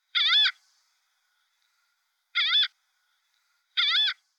Aztekenmoewe Larus aticilla; Vogelstimmen

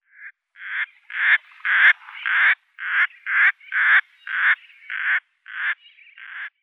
Russseeschwalbe Sterna fuscata

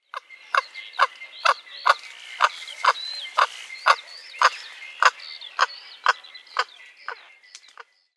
Silberreiher Casmerodius albus; Vogelstimmen

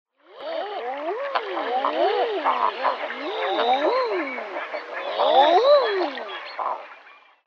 Eiderente Somateria molissima; Vogelstimmen

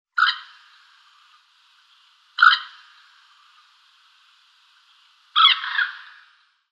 Teichhuhn Teichralle Gallinula chloropus; Vogelstimmen

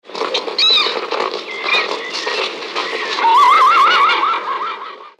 Przewalskipferd Equus caballus przewalskii; Tierstimmen

